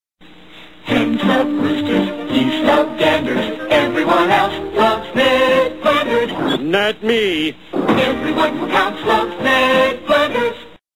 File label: Song; Ned Flanders; The Simpsons